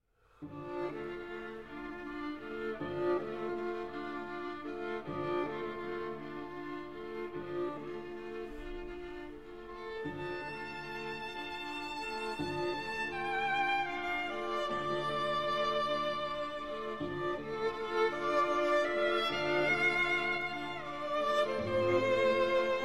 String Quartet; String Quartet No. 12 in F Major, Op. 96, B. 179 American; Dvorak

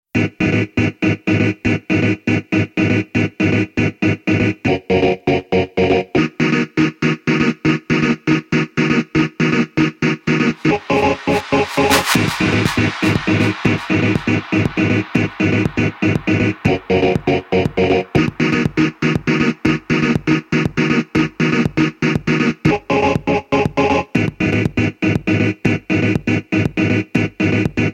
Instrumental Version

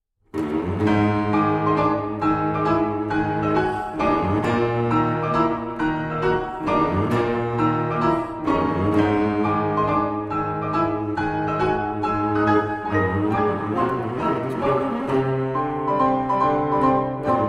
Cello Sonata in C Major, Marcia. Energico, Live; Op. 65; Ed. Rostropovich

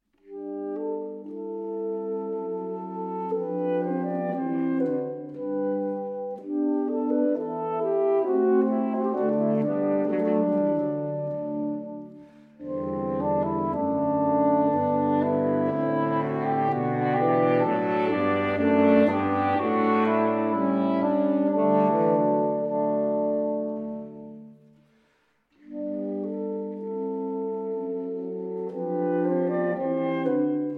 Saxophone Quartet, Sarabande; Holberg Suite, Op. 40

